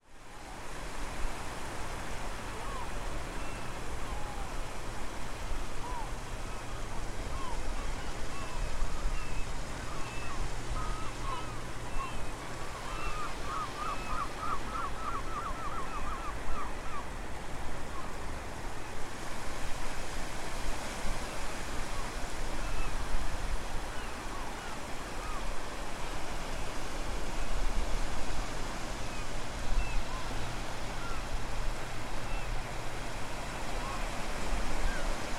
sea; The Witcher Hunt v.1011

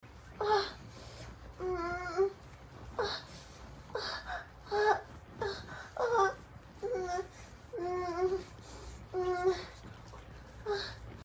sex, moans; The Witcher Hunt

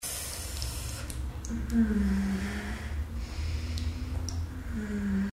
suck; Low Blow

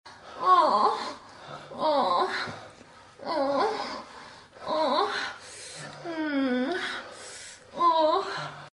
jerk, moans; The Witcher Hunt

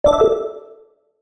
Button; Treasure of Nadia

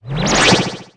Wind; Treasure of Nadia

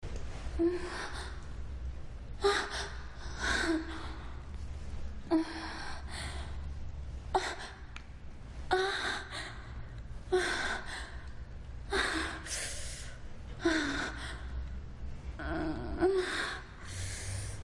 moan, moans; The Witcher Hunt

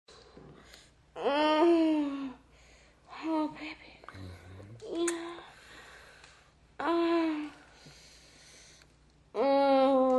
moan, moans; The Witcher Hunt

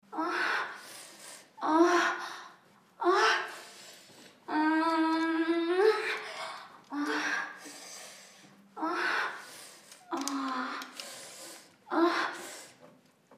sex, moans; The Witcher Hunt

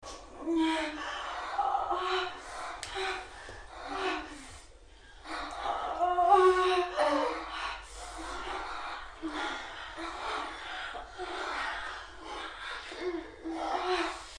sex, moans; The Witcher Hunt v.1011

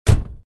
car close; Treasure of Nadia

